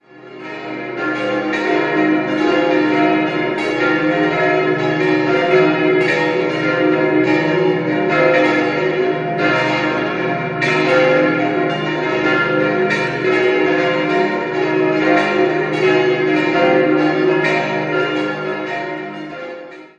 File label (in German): Fiecht, Glocken